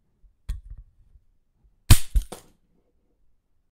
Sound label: Mouse Trap